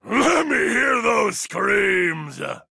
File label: Screams